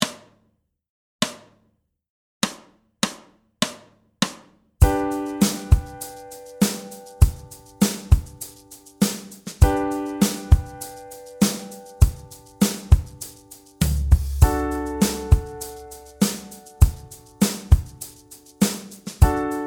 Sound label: No Bass